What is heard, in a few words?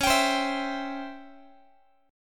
Db Minor Major 7th Flat 5th Db down Guitar; x,21,22,22,20,x; 4A,4E,5A,5D,5G#,6C#; strummed